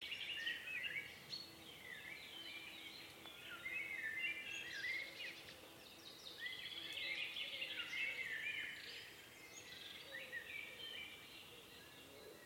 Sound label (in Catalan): Biofonia crepuscle Camí Binifaldó